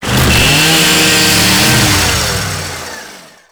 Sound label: Saw Ride Start; Warframe; Kuva Ghoul Saw